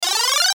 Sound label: ASCEND SYNTH, Digital; Multimedia; Hit, Digital, Ascend, Descend, Production Element, Imaging Element, Accent, Transition; Blastwave